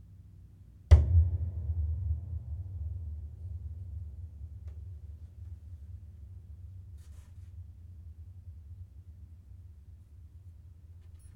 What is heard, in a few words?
Piano Hits Hand